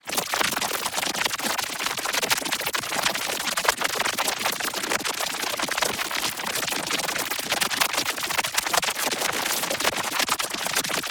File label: ABILITY CHARGE Sparkler Electrified; boom library; Matter